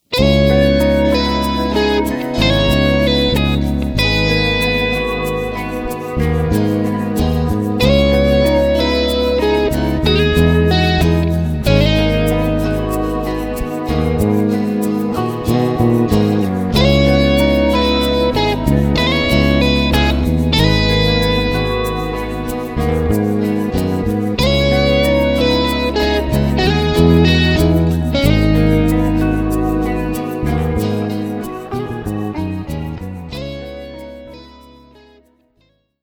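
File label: Arp Guitar Band; Arp E Gtr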